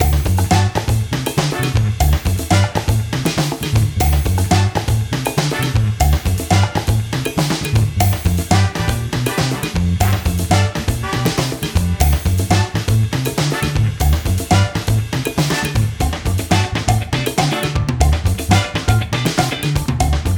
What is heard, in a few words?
Afro Funk Loop